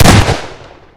shotgun fire

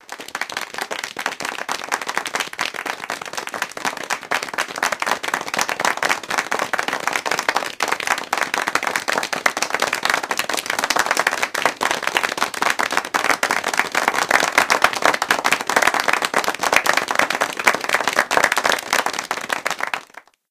boring applause; Source Engine; tf

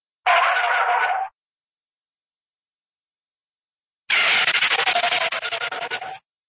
skids; cartoon; hannah barbera cartoon sound fx

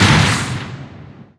giant common step

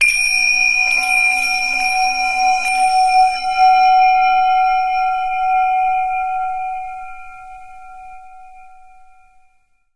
zen tingsha; Source Engine; tf; japan fundraiser

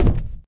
cardboard, player footsteps survivor walk

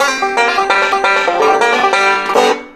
music; taunt; yeti; engineer; source engine; tf

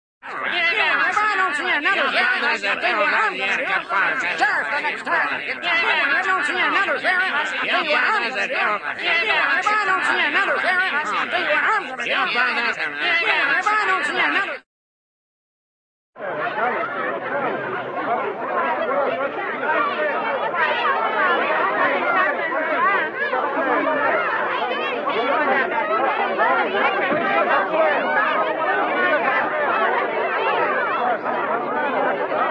crowd, cartoon small angry male crowd

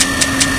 gatling empty, weapons; tf

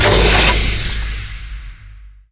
elevator arrive stop

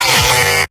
pain sharp; heavy; video games; source engine; tf; mvm

